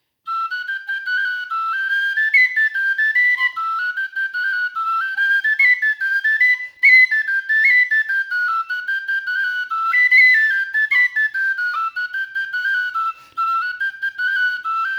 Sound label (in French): Suite laridés tin whistle